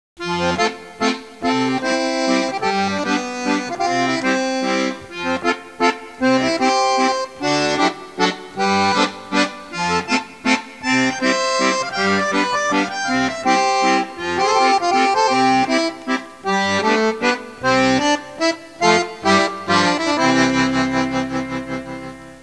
suonerie musica traditional usa country; american

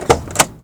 DOOR OPEN, auto; trasporti

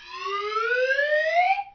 cartoon rise; cartoons

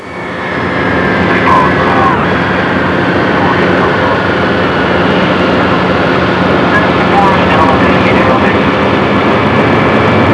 COCKPIT, aerei; trasporti

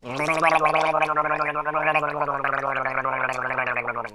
GARGLE

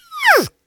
WHISTLE; cartoons

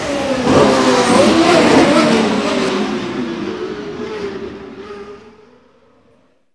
RACE CAR; trasporti; auto

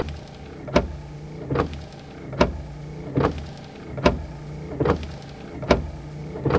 WIPERS, auto; trasporti

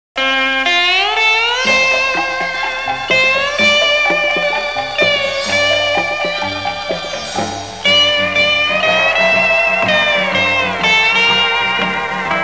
suonerie musica traditional usa country; american

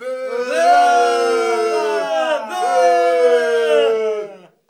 BOOOOO

